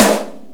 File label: ROK SNR, FM DRUMS; East West; Global Sounds